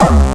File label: Bassdrums Hard Kicks; Vengeance Samples